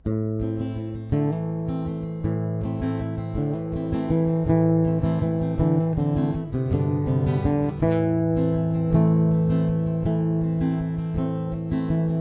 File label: verse1 full, guitar